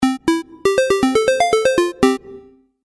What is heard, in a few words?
Game App Melody, Video Game Sound Effects